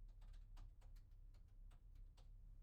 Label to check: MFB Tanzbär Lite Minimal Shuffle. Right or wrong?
Wrong